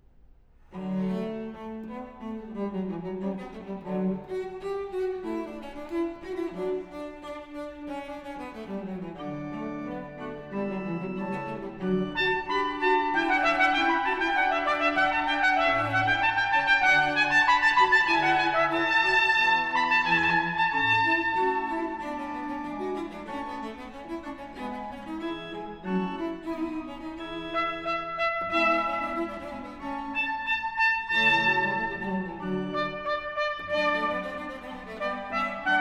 Sinfonia for trumpet, strings & continuo, Allegro moderato